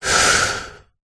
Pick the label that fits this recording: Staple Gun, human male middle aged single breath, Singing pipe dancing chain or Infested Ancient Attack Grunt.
human male middle aged single breath